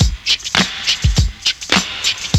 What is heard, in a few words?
TAPE LOOP, BREAKBEATS; Crypton - DrumBase5000; BREAKBEATS#4